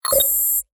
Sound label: wallet